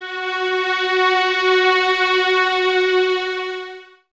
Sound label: String Violins; String Ensembles; Miroslav Vitous; 11 Violins